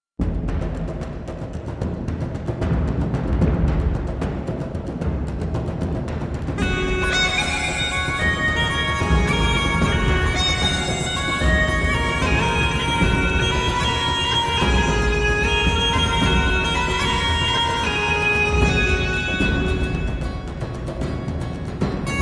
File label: Celtic Vibes